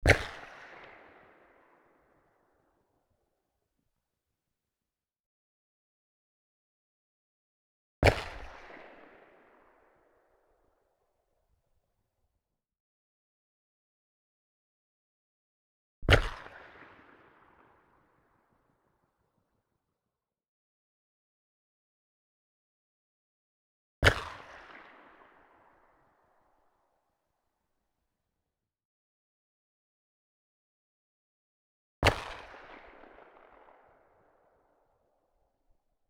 Rifled Percussion Pistol M1850 - FIRING - 20m Behind a Container; Black Powder Guns